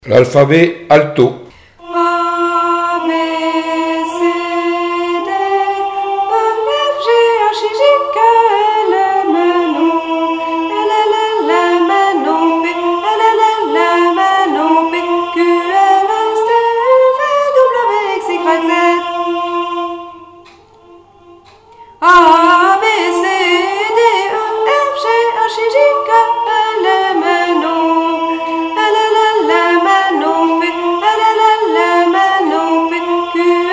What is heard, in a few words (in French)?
chant Alto; L alphabet de Mozart